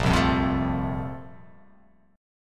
A sharp Major 7th Suspended 2nd, down

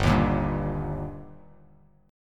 G Minor Sharp 5th G Bass Standard, chord; strummed; JGuitar

